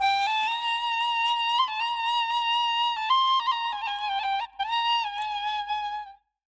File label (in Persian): avaye ney, ملودی های آماده و از پیش نواخته شده ساز نی